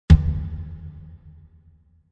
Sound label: Impact High Tom Short Tail, Impact hit